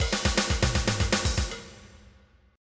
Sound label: patterns, blues; Sounds Terrific II; Weird Science; drum